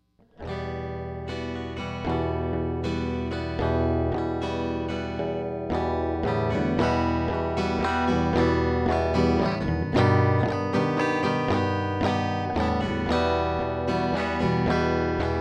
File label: harmonic minor e